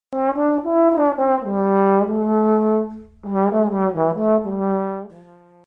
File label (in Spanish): trombon; ecoescuela